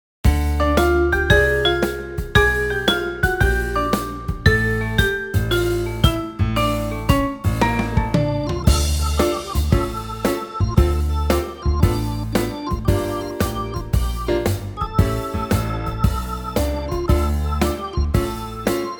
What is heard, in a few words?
Switching it on backing track